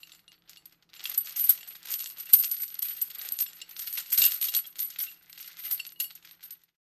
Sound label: Workout weight lifting steel chains